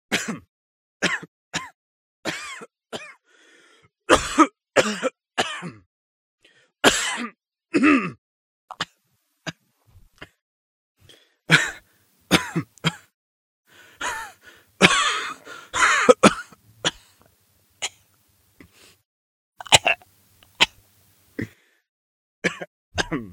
Coughing; The Joy of Creation Halloween

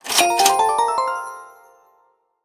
Item Purchased; Ellen's Garden Restoration; android

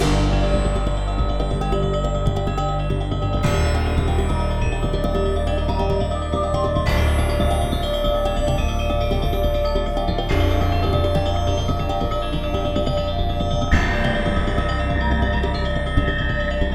Gamelan techno Slow; rive wreck hack die retry; ps4

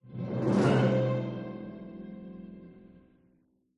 Kungfu Fight Intro; Minigame

